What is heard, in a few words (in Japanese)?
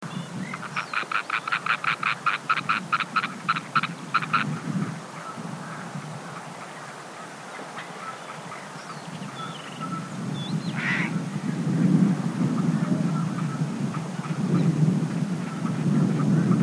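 aosagi, grey heron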